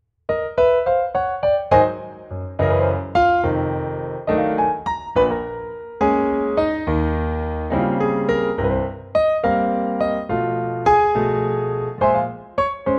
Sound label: Ab CFX piano